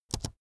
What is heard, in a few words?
keyboard laptop space press fast soft